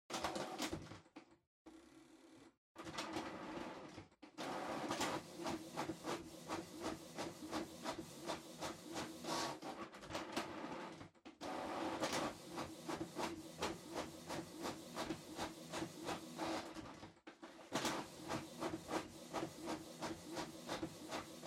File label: Printer Far Print Multiple